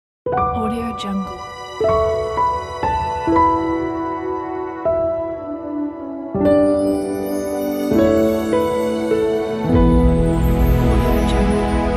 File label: warm fairytale piano logo; musicdog